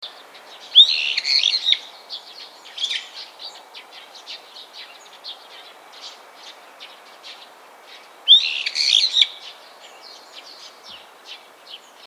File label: Sturnus philippensis